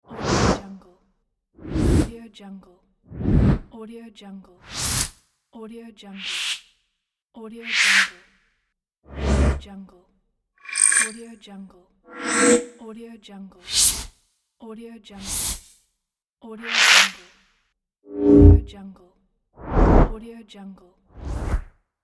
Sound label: fast swooshes pack